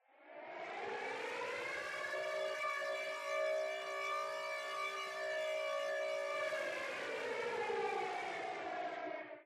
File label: Evac Siren